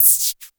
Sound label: KLONE NOIZ, Klone Dual Percussion Synthesiser, DRUMHITS MONO